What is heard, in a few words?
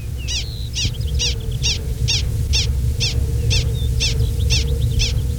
human chirps